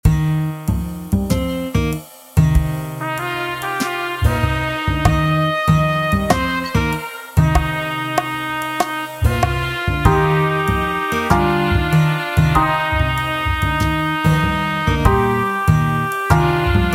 Hot Cross Buns Practice Tempo E Flat

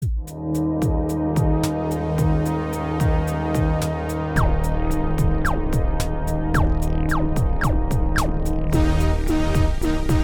Flossys Flying Saucer Practice Tempo B Flat

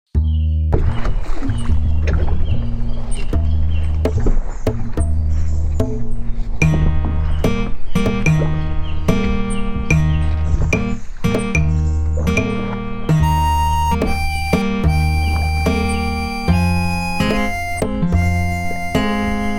Lightly Row Practice Tempo E Flat

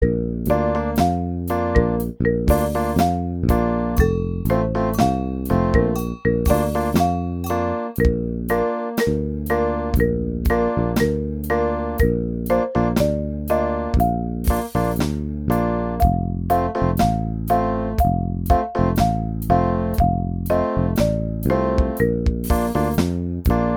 Elevator Song B Flat

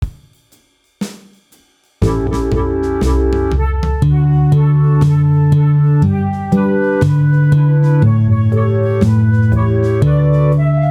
Little Brown Jug guitar practice tempo

